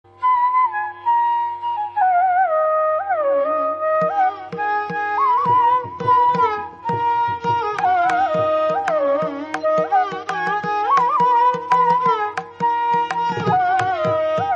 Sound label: flute cover instrumental